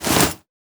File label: aim foley lower